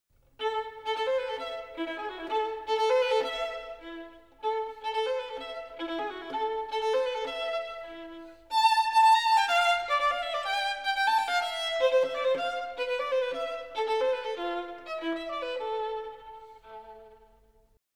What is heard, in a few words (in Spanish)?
Sonido de violin; Instrumentos de cuerda; Cuerda frotada